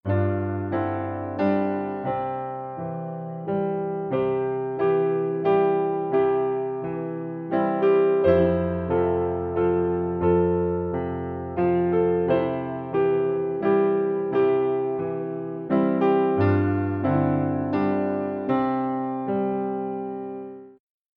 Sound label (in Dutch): Knikkeren 1e stem